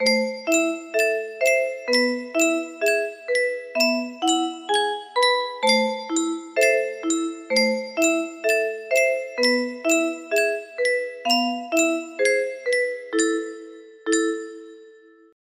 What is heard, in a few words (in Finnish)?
yunsheng soittorasia